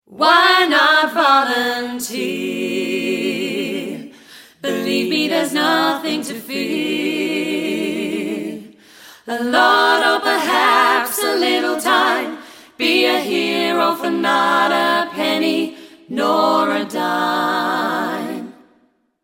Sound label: singing; vinspired; tweetagram; thefeedblog